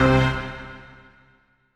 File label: CHORDS; EastWest; Dance Mega Hip Hop Electro